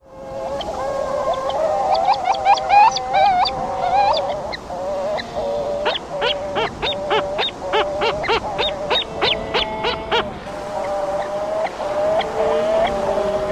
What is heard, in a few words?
various calls at colony